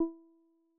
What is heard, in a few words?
Pulse; Apple; iOS-17; RealityKit SwiftUI.framework